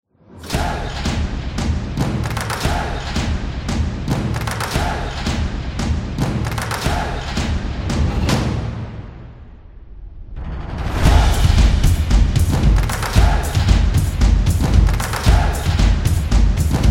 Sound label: Indie Drums